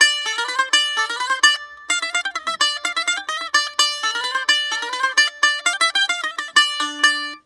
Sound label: 128 BAG, BAGLAM, Mediteranean Traditions; PH BAGLAM